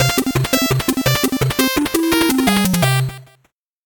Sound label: 8bit jingle normal